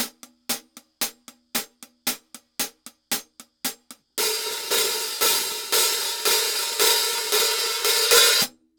Hi-Hats, cymbals

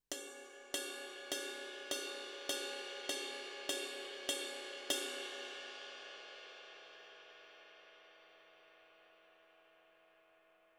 20 CRASH RIDE BELL, cymbals

